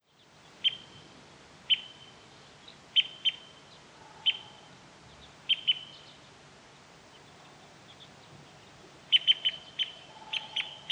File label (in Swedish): större korsnäbb Loxia pytyopsittacus flyktläte